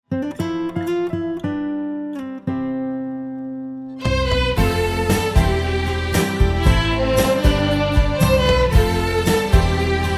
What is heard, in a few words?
Alleluia inst